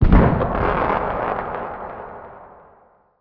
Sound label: explosion grenade emp low